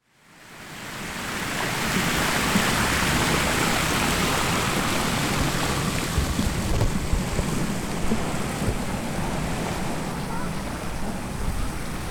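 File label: chuuou kouen no mizu, water in the central park; kikushima; Chino Cultural Complex ARCHIVES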